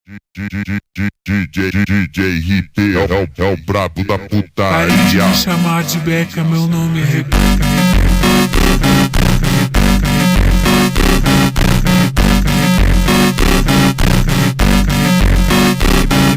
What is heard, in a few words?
super slowed reverb